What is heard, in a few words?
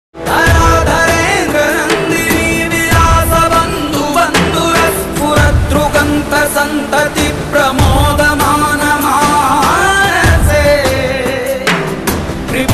8d ringtone